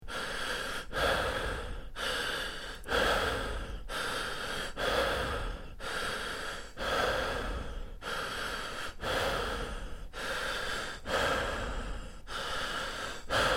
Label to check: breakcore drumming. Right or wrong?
Wrong